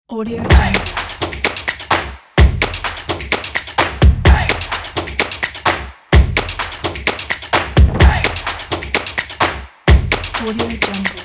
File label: percussion drums